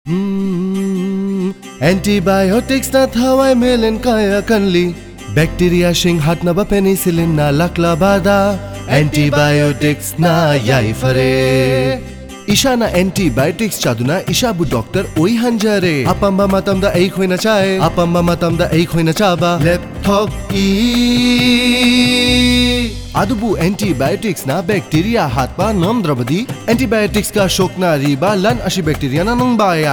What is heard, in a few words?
Antibiotic Jingle Manipuri; jingles in 19 languages